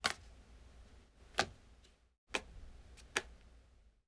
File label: Handling A Deck Of Cards; Fairlight Sound Lib